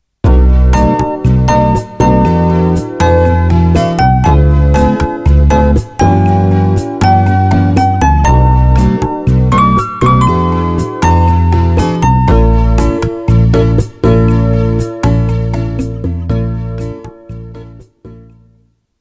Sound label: appointment, ringtones